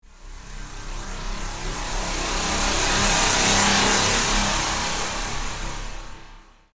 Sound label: inversion; horror